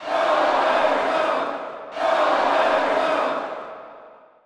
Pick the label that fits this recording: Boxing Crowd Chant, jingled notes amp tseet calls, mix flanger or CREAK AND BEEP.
Boxing Crowd Chant